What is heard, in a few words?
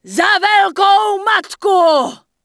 Huntress Warcry; wowpreklad; czwow